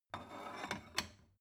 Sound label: stone bowl shelve light